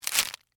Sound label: Forest Branches, Footsteps, Forest, Sticks, Branches, Single